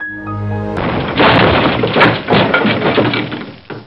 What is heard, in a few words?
shutdown